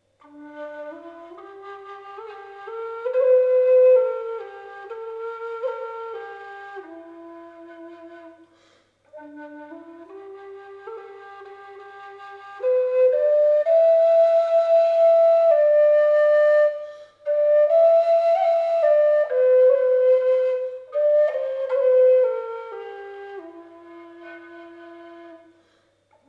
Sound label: low d whistle